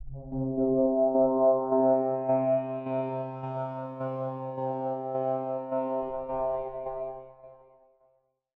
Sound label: Pad; Dubstep